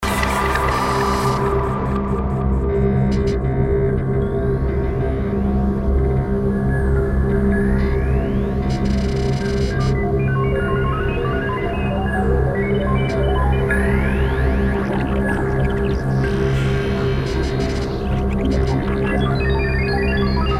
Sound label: Requia Gallery